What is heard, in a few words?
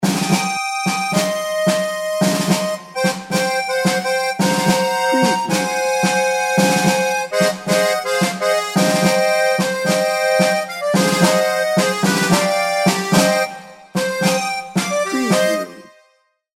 tango accordian snare